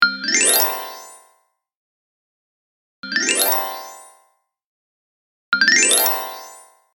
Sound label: kirarin